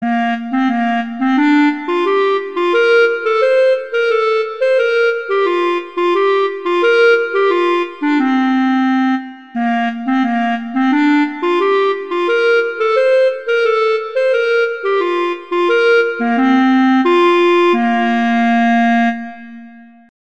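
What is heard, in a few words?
Humoresque, cello; Very Easy Collection; Dvorak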